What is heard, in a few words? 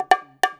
FILL, Percussion; InVision Interactive; Percussion Slam; BOLERO